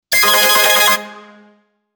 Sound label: rearm sound effect mobile, gaming